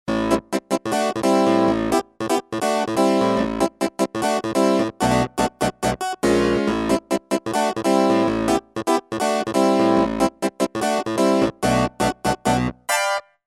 Roland MKS-10 Planet-P clavi chorus

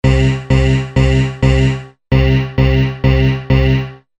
AMBIKA 96kHz 48kHz 2 oktaves Down; EIII

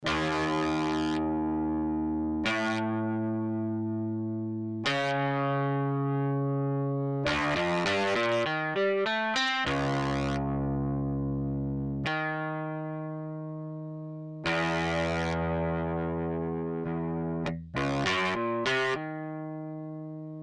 LPC Atomic Crunch

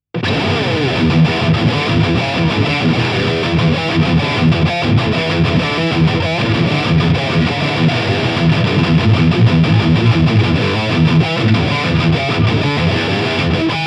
LTD MG 1001 High Gain

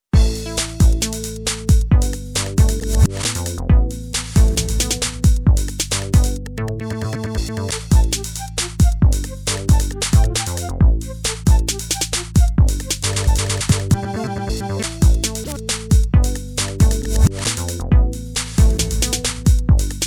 Casio XW PD1 2Step

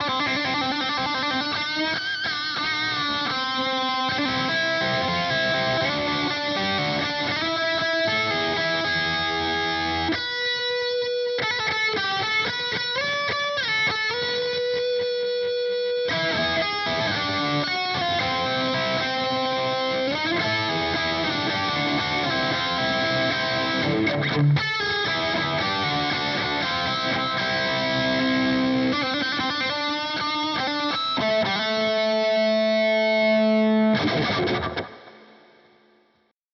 Amp Lead British; Boss GT-1000 Core

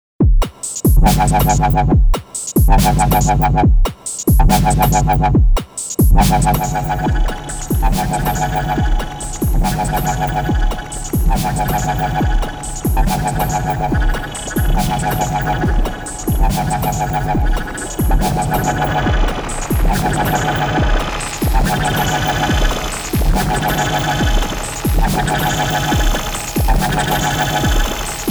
iMS20 Echo Pad

